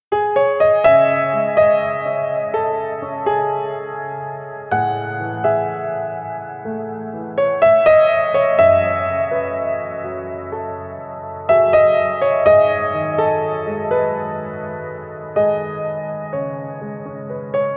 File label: Piano Version